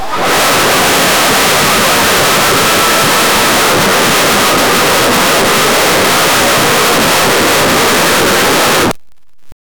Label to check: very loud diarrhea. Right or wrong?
Right